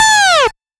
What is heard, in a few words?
synth shot A; Electro; Anthemic; synth shots